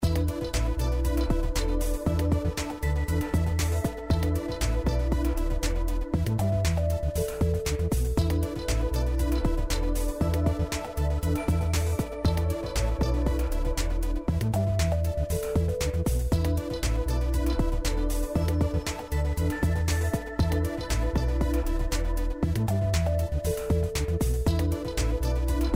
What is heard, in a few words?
Bonus BGM; Robot Vs Monster